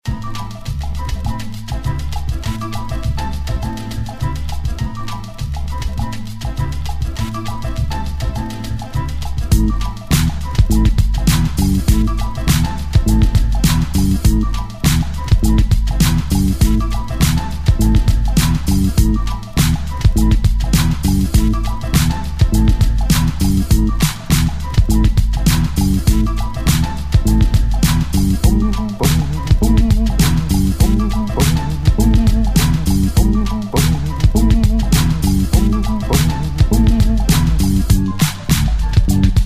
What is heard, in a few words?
Untitled Skit (Instrumental)